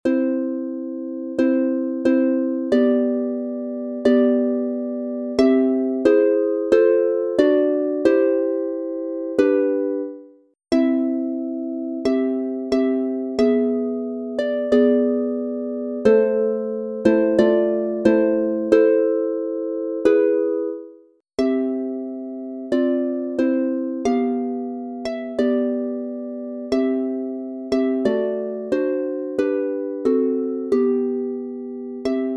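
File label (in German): C Dur 3 stimmig instrumental, Harfe; Totengedenken; Ueber den Sternen; Klopstock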